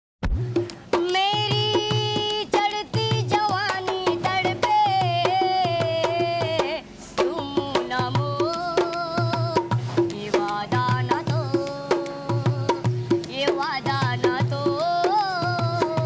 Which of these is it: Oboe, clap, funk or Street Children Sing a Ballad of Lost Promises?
Street Children Sing a Ballad of Lost Promises